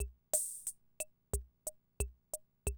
CR78, DrumLoops

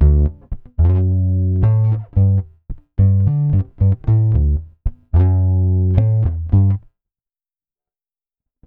Pop, Bass

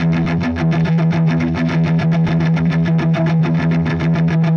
Chunk Guitar; Sonic Foundry (Sony Creative Software); Indie Rock Anthems; Session 02 - Clinical

